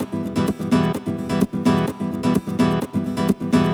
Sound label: Nylon Guitar E min, 128BPM; Vengeance Samples; VEH3 Nylon Guitar Kit 2